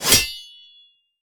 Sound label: sword tsing